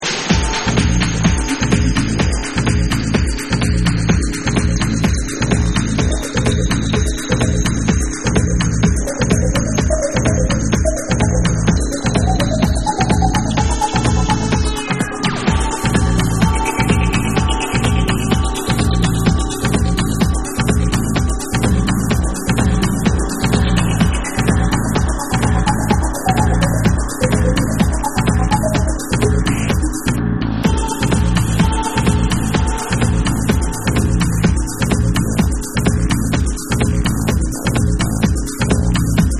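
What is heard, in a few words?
Guitar Dub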